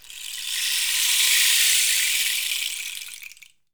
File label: RAINSTICK; WorldSounds